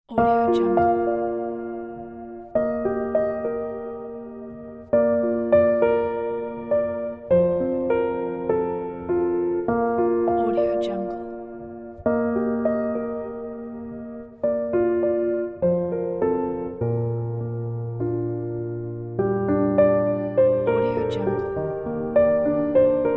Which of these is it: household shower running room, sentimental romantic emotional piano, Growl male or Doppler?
sentimental romantic emotional piano